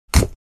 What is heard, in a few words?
step on the snow; animal